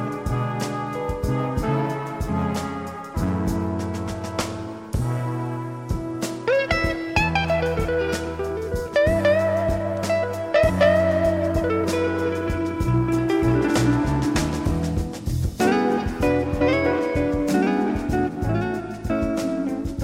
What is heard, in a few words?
guitar